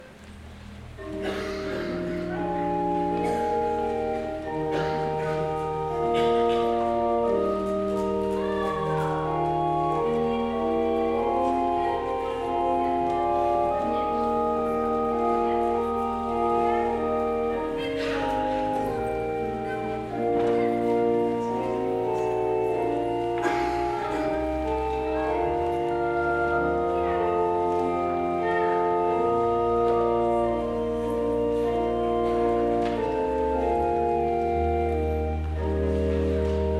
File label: Organ; O Morning Star